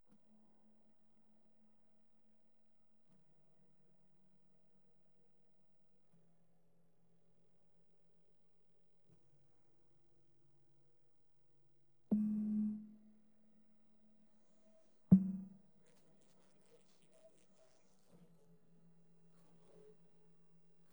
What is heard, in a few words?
drums